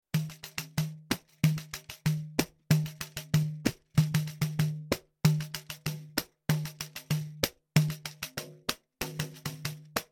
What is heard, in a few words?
pandeiro